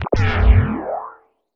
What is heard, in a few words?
boing; Spectrasonic Distorted Reality 2; BEMUSED